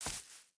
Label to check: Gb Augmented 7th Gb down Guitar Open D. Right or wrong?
Wrong